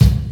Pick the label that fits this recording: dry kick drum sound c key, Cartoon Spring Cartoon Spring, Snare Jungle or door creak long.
dry kick drum sound c key